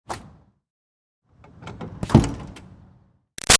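Door Metal Commercial Pull Exterior Light Open Close; Doors; Big Room Sound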